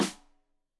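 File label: Snare Stereo, Drums; Deeply Sampled Drums; SMDrum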